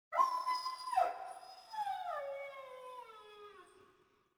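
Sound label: village dog, colombia; ambience; Soldier Of Fortune II Double Helix; XBOX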